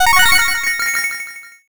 jackpot

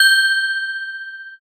handbell

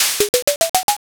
board flip

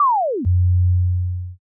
time stop

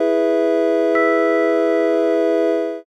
church

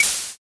can open

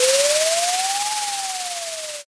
drift

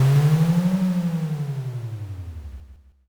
dragon

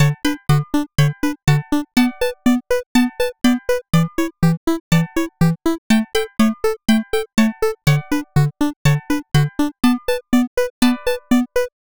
bgm

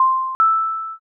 elevator

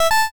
pico hello

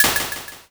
egg crack

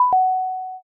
stop button

